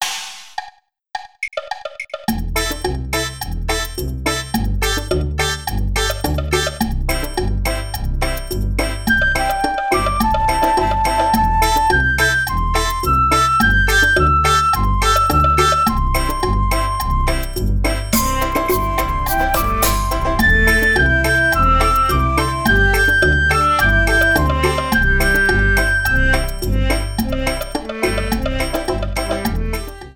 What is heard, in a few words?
Chinese melody Major Pentatonic